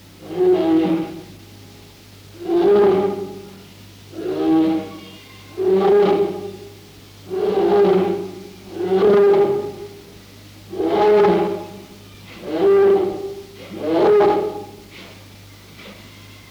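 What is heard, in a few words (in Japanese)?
hokkyoku guma, ホッキョクグマ 鳴き声; 多摩動物公園; 北極圏で食物連鎖の頂点に立つ動物。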